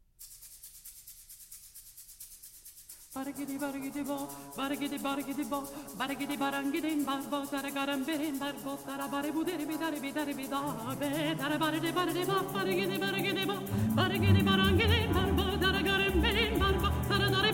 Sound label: folk songs